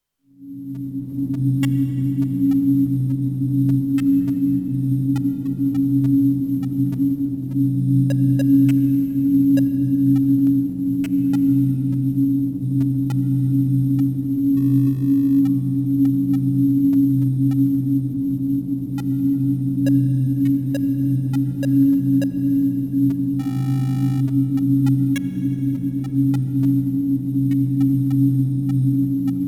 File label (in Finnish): aluksen sisatila